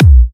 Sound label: A#, Kicks; markus hakala